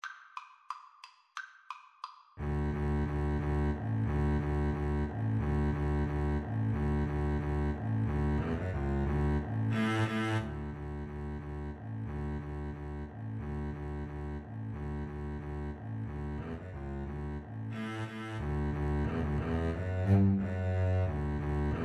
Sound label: Violin Bass Duet; vivaldi spring